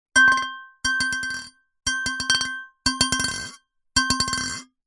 household empty metal cocktail shaker bash against drinking glass; zapsplat